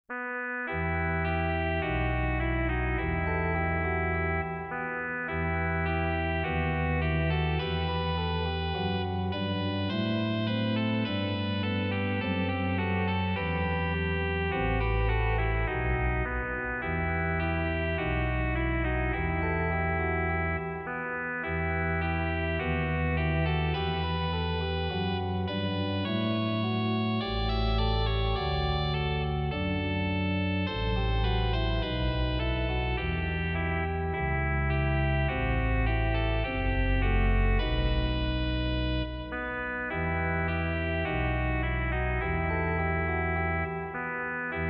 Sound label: trumpet tune in E minor, organ; Short and easy Trumpet Tune for organ. Trompette d'orgue, piece courte et facile